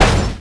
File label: Box Collision